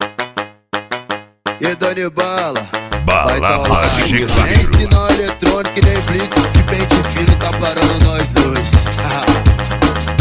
brega funk; putaria 2020

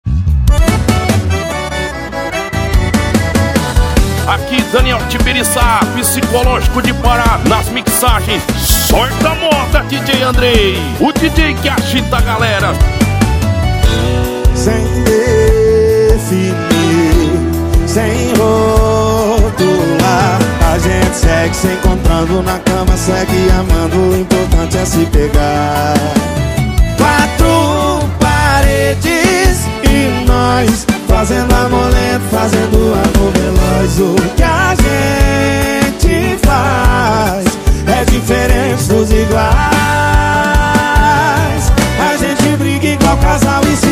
sertanejo com locutores de rodeio

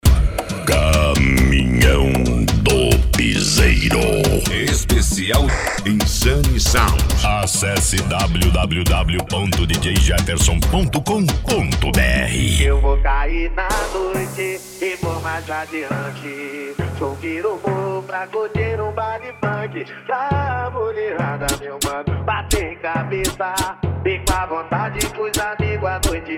caminhao do piseiro especial insane sound

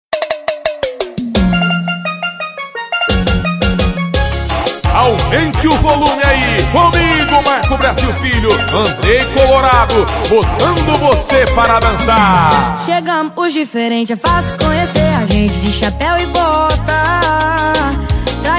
sertanejo com locutores de rodeio

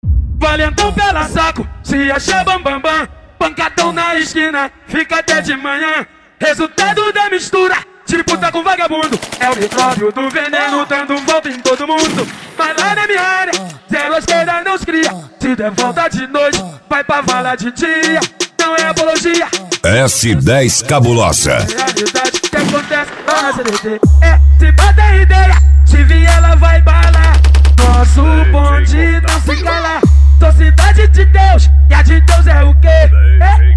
funk comercial

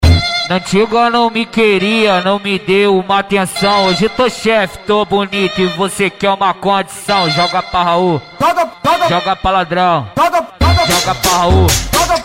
funk ritmado; cuiaba mt

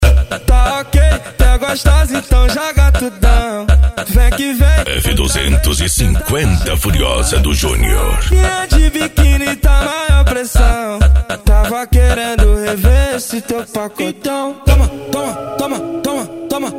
funk e sertanejo

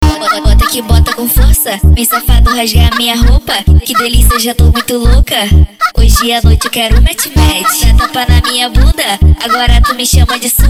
funk consciente e funk ritmado

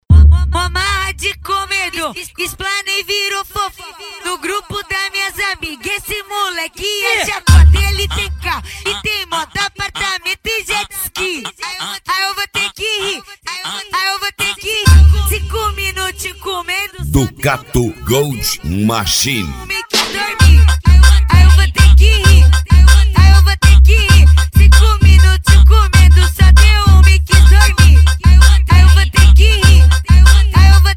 especial funk

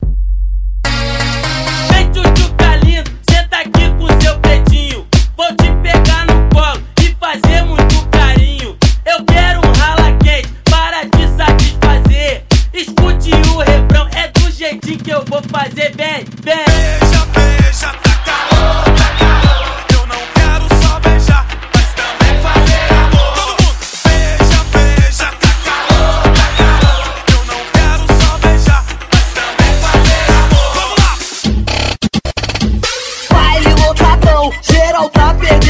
eletro funk; frequency mix

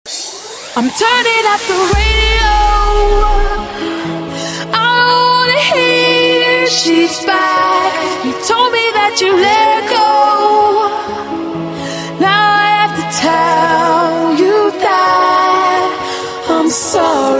musica eletronica sem vinhetas; balada g4

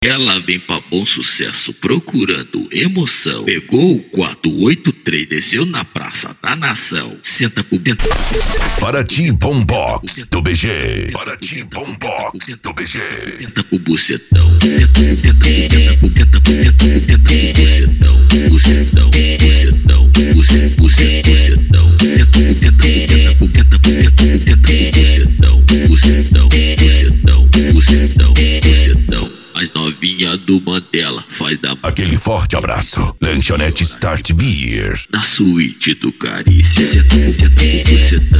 bombox funk

